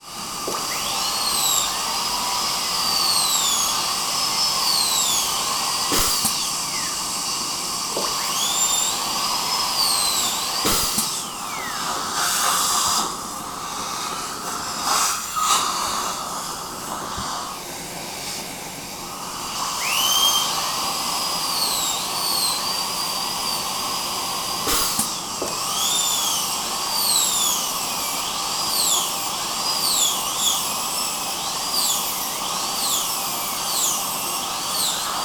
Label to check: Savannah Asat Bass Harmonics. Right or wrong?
Wrong